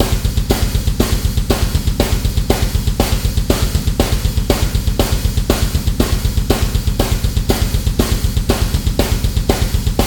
Dbl Kick Rockier; Shawshank73

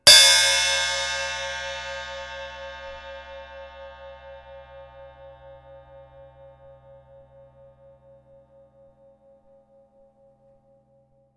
Cymbal Hard Hit

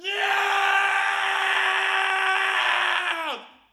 A Single Scream Of A Young Male

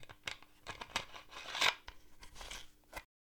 Bottle Cap Getting Screwed On

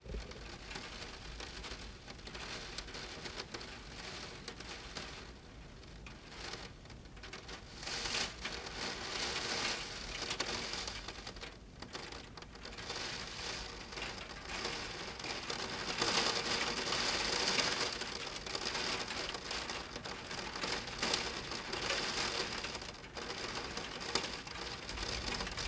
Rain On Window Interior

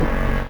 Granular

